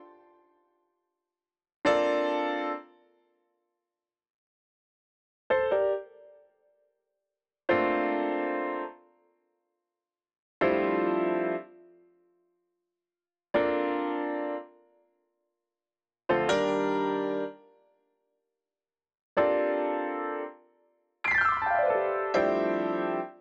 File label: piano C; Big Fish Audio; Legendary; Shes Mine